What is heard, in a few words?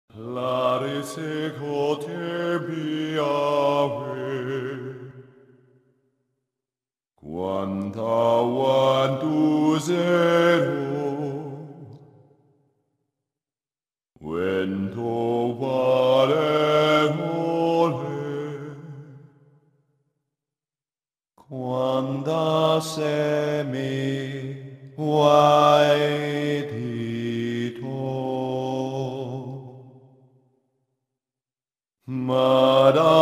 Chant; H2; Prophet of Regret